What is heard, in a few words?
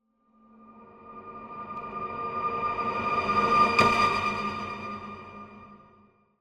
Symphonic Hit Reversed And Pulsating Pizzicato; Hollywood Edge; sound designer's toolkit v2